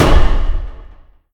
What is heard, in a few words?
airdisc impact; smite; kukulkan